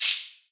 Shaker; Novation LaunchPad Mini MK2; Ableton Live - RGB Demo; CPCT Kit 1

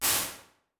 Gated Water Snare, Medium Rooms, IR Samples; Convolution; NI Kontakt 5

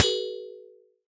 Alien FM Perc Short

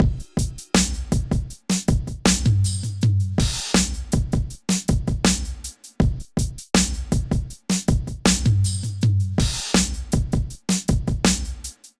Kit 80 bpm, Drums; MIDI Clips; Dobbs; Ableton; Factory Packs; Chop and Swing

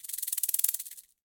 Cabasa FX; Latin Percussion; Drums; Drum Hits; Shaker; Ableton; Factory Packs

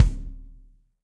Kick Felt Dry Acoustic; Drums; Drum Hits; Standard Hits; Ableton; Session Drums Studio

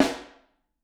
Snare Overhead

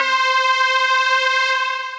Stringbell, Synth Lead; Ableton; Factory Packs; Beat Tools

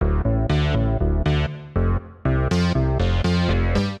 Dm 120bpm; Music Loops; Silent Sawyer; Ableton; Factory Packs; Singularities

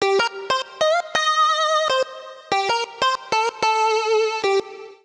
Wah Lead (Cm) 95bpm; Music Loops; Ramsel Wah Lead; Ableton; Factory Packs; Singularities

